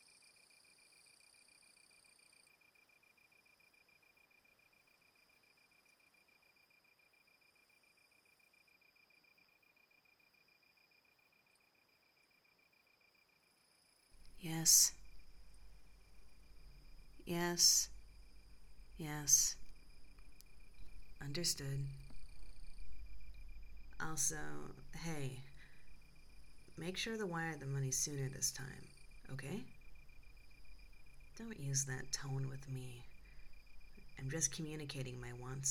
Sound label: asmr sfx